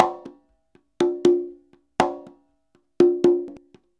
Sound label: CONGA 119